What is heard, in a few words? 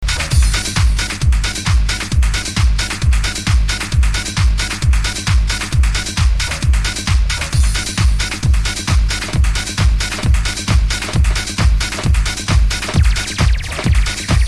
Mixing Show House